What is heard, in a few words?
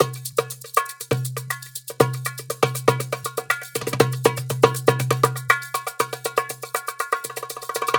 percgroove 120, Perc Grooves; Slate; SD SEISMIC Drum Sample Pack; Signature Perc Grooves